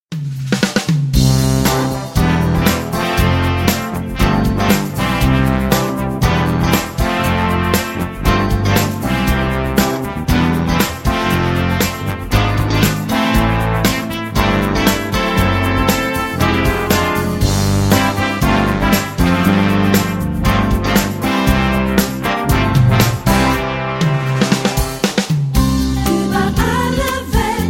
Brass, Studiochor

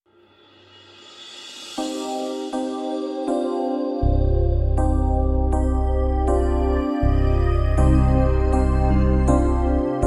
Intro Instrumental

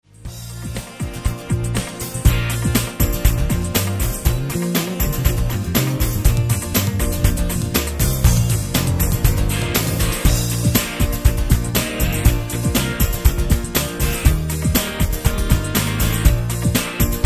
PLAYBACK Michael Row The Boat Ashore

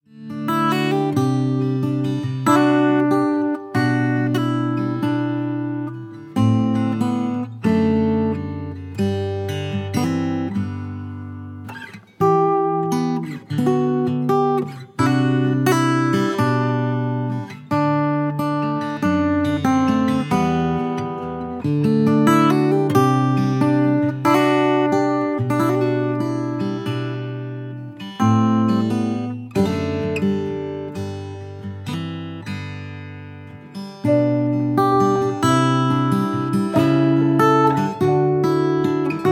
Instrumental

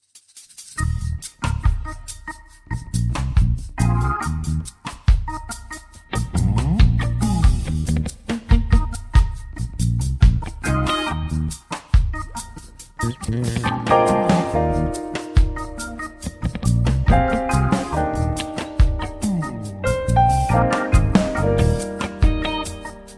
Tenor

